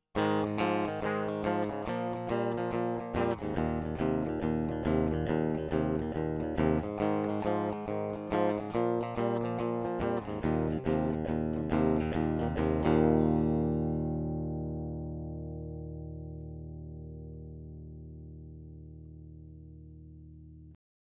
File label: Clean Combo